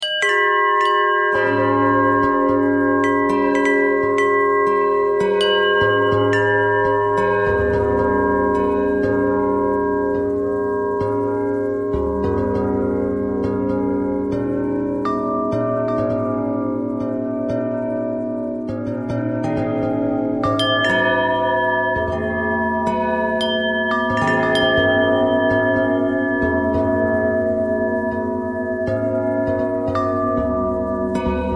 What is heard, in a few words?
bell bell thread noise, bells